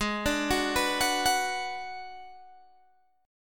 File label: Ab Minor 7th Flat 5th Ab, down, Guitar, Open G